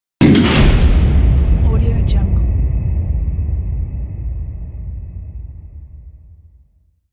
grenade

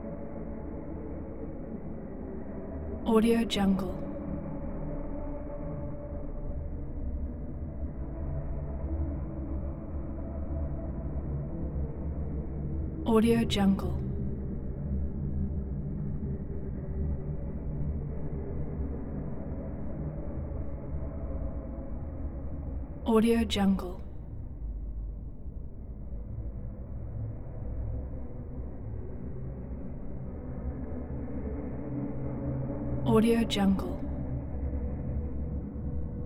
time travel

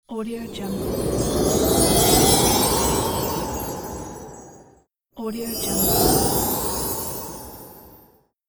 christmas wind

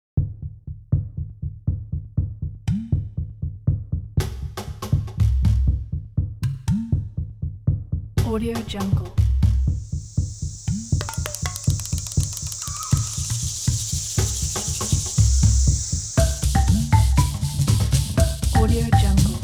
wildlife documentary

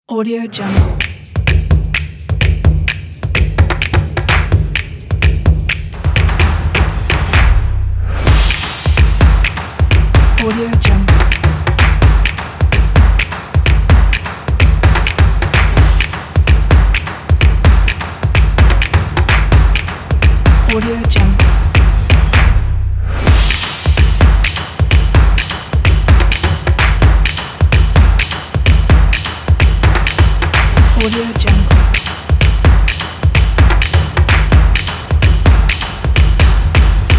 percussion; teslasounds

